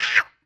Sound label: Bird Red Collision; fowl